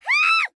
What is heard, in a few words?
female scream